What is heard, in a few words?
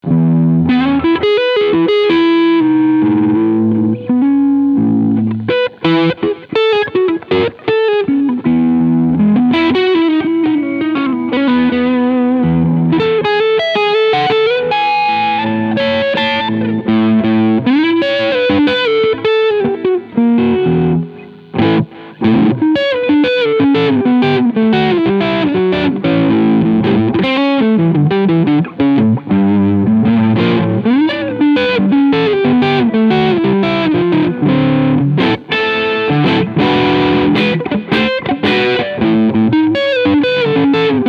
Harley Benton EX 76 Classic GHW AN Overdrive Crunchsound Neck Pickup